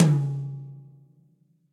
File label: Tom Mid Session Dry; Drums; Drum Hits; Ableton; Session Drums Studio; MPE